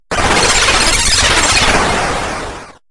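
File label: psycho bird; pyscho Bird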